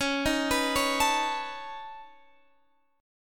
Db 7th Suspended 2nd Sharp 5th Db down Guitar Standard; Db7sus2#5 arpeggiated; JGuitar